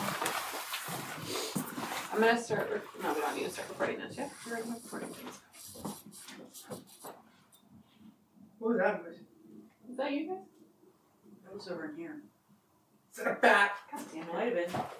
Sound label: Attic EVP Start of Night; At about :04, we captured the screeching of a bat; greene county historical society museum return investigation